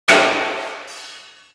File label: fx hit, Cartoon Film Effects